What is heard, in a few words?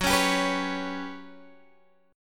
G Major 7th Flat 5th Gb